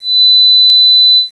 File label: tinnitus; cstrike